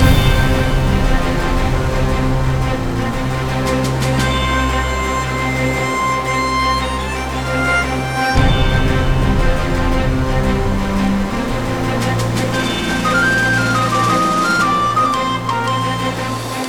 free Spin Splash Loop; netent

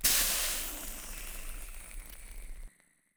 count Up Cooling; netent

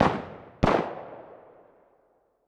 ambience gunshot; netent

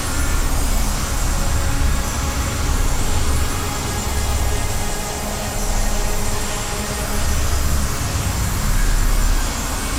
mega Win Loop; netent

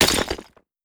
win Refill; netent

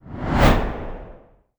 cone Flying; netent

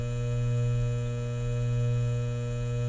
feature buy loop; netent

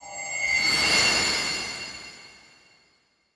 scatter win

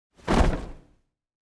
close Curtain; netent

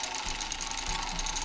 wheel Spin Loop; netent

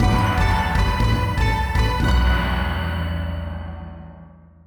win Large Legacy; netent

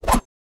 map Knife Throw; netent

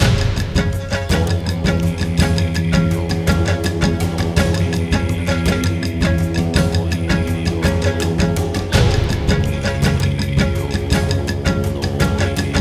respin music; netent

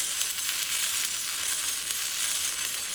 knife Friction; netent

